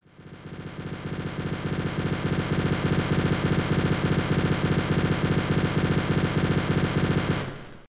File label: chain gun; w3d